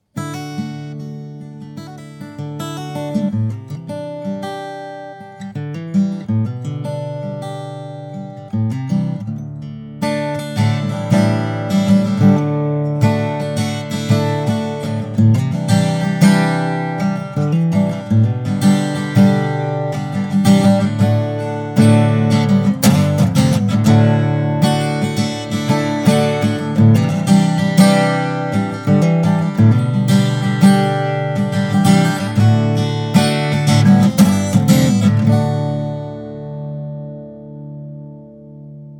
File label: Guitar